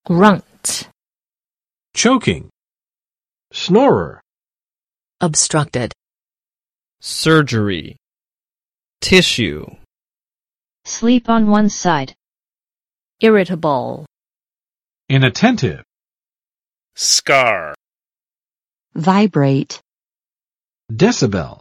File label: grunt; Snoring: Sounds Like Trouble 鼾声如雷，伤的是谁？; （猪等）作呼噜声